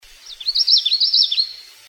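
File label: Common Yellowthroat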